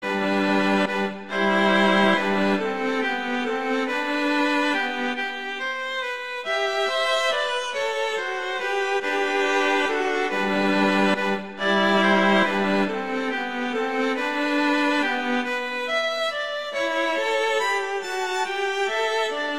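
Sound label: Duet Andante, violin viola; Pleyel; Duets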